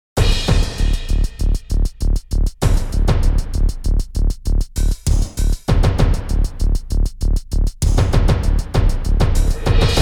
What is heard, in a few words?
insert coin